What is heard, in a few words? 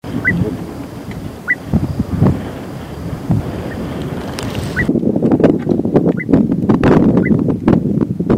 inland dotterel